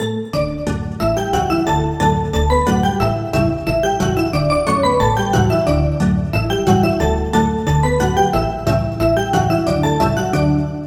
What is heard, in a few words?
Bell; Tea Cup; Routed Sound; Vital Expansion - Story Walker Vol.1